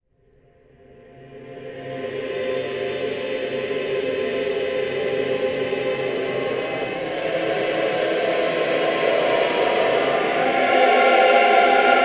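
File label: 12 tone reverse, avante, voices; AVANTE G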